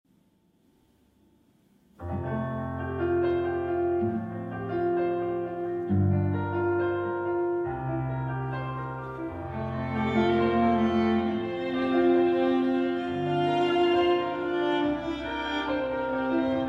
appassionato, for Piano Trio; fourdrain

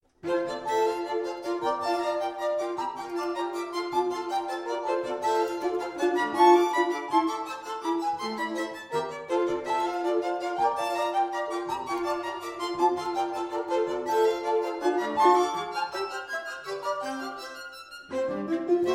quartet; pogojeff

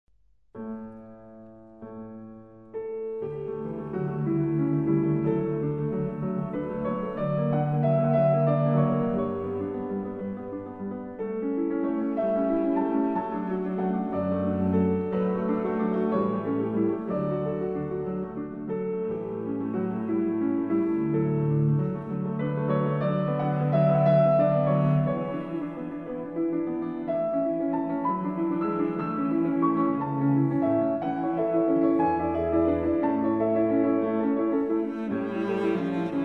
cello sonata; franck

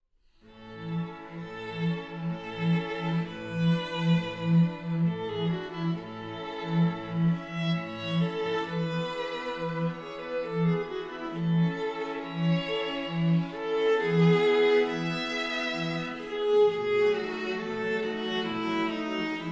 trio

